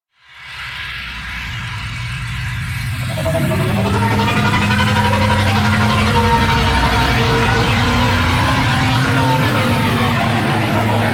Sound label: Extraction Space, Vehicles, Flight; WM Liset